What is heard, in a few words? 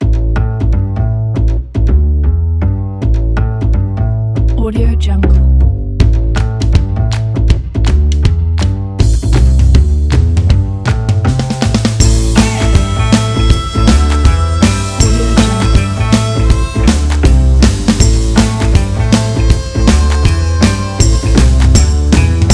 western energetic bass